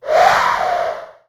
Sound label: Synthetic Blow